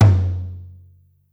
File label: Perc Tom; Percs